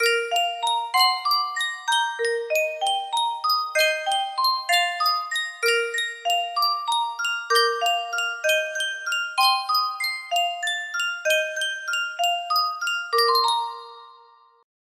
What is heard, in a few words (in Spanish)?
Sankyo caja de musica